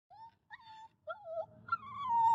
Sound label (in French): petit chiot; Un chiot; Bruitages